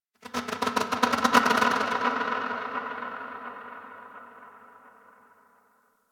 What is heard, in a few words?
Creature Distant Mid; Ambience; Misc Outdoor; Warframe; Infested Eris